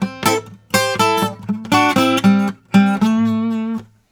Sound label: Blues Guitar Samples; ILIO; Fretworks; LICK